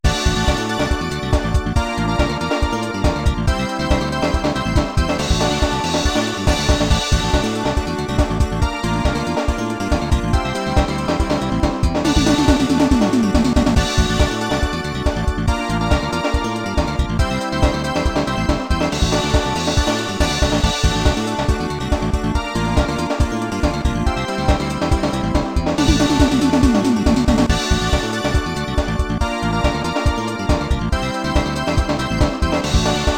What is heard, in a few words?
Skill Game, Congratulations; Snowboard Kids